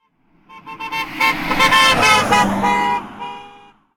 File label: car horn; Blind Drive